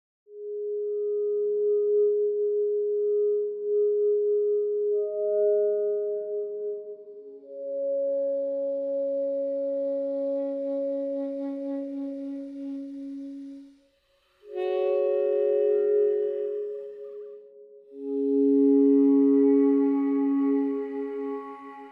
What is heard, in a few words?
Pads and Toy Flute; The Last of Us; Pads and Toy Flute Mood